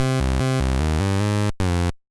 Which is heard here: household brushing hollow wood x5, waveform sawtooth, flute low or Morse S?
waveform sawtooth